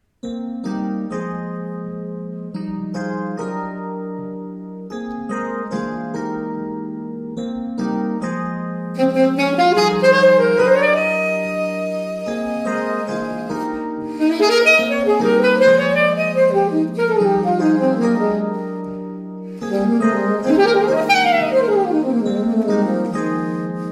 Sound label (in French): Valse Solo